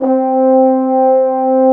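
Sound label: French Horn, c4; BGM; Nintendo; July 2020 Gigaleak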